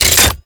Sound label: Ink Rifle Mech End, Weapons; Warframe; Tenno